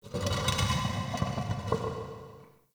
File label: Stone Movement, Crypt Ambience; One Offs; Champion Crypt